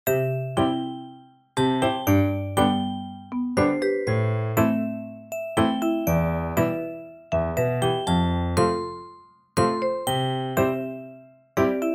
Nhạc nền vui nhộn hoạt hình ngắn

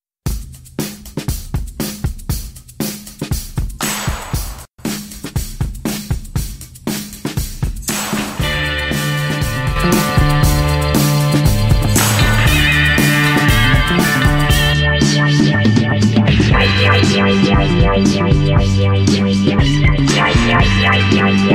nhac kiem tra am thanh noi loa trai phai giua

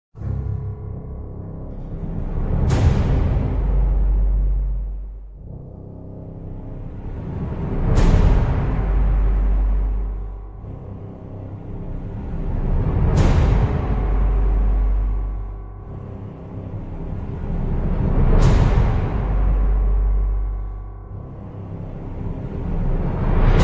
Am thanh cai ket xuat hien hoi hop va ly ky

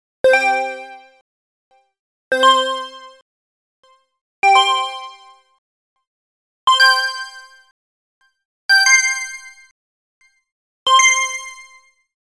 Cac mau hieu ung am thanh tra loi dung

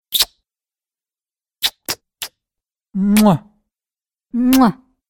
Tieng hon moi

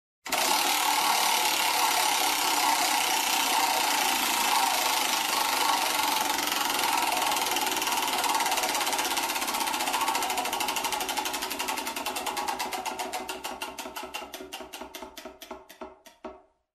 tiếng bánh xe quay thưởng game vòng quay kỳ diệu

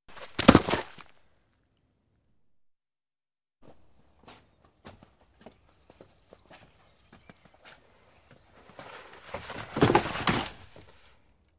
Tieng roi do vat

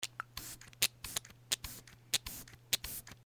Am thanh dung binh xit phun thuoc

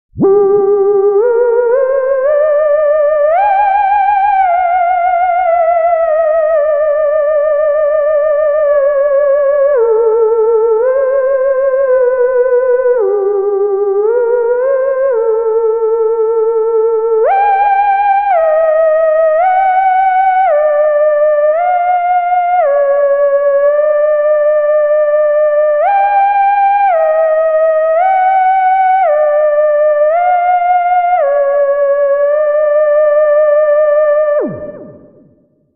Am thanh ma quai theremin

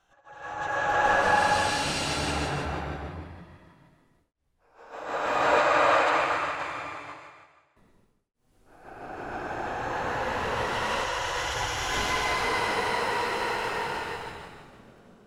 Âm thanh linh hồn lén lút

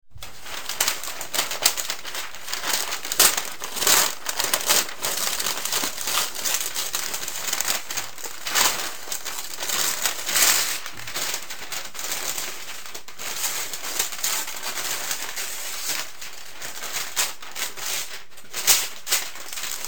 Tieng xe giay to

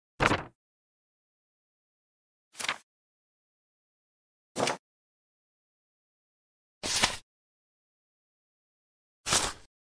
am thanh hieu ung vo giay trai giay

